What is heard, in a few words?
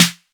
smooth acoustic snare f key; snare drum samples